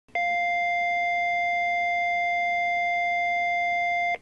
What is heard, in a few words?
lifepak12 tone b